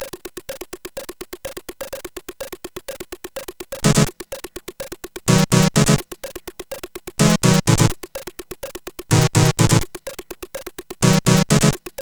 Bassy Introtune, CSG8580R5; hvsc

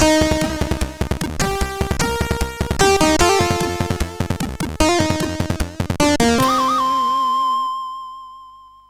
sid, CSG8580R5; Gunfright

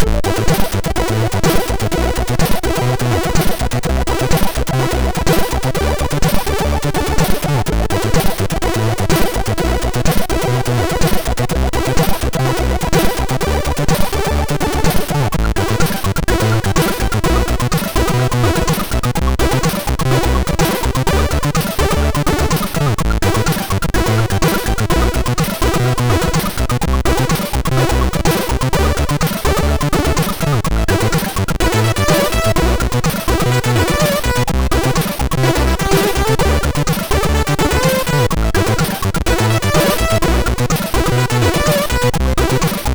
Trap, sid MOS6581R2; Morton Adam

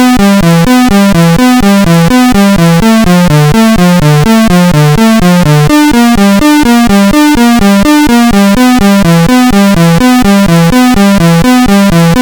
Melody, sid CSG8580R5; HVSC; 3-Tunes

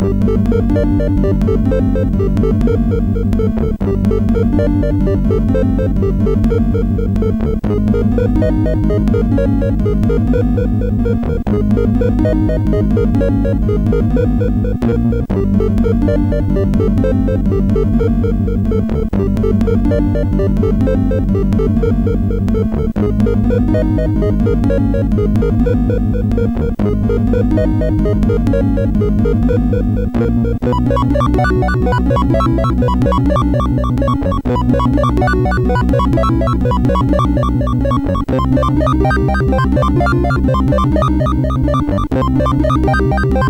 note, MOS6581R4; hvsc

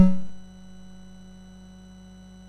sid MOS6581R2, GAMES; Face Off

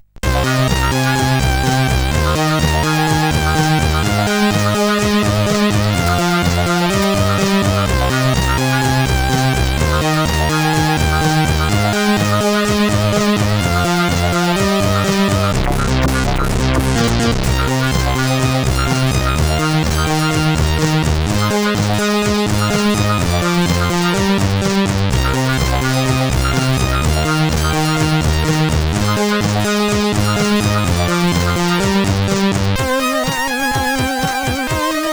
Muecken Theme sid CSG8580R5

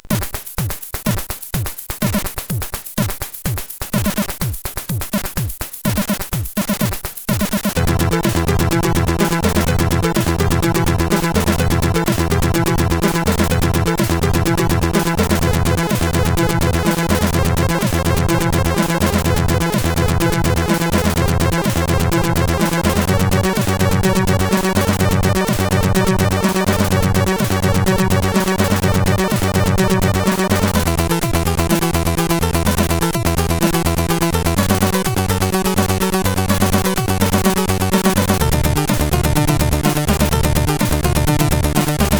Short Techno sid CSG8580

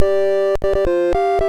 sid MOS6581R3; Snokie

